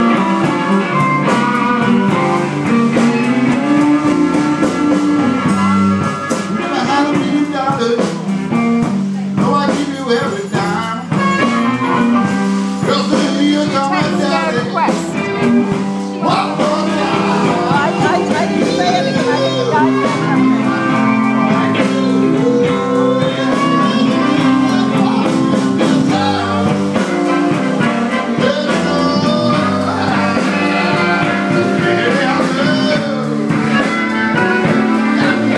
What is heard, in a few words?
the blues played by band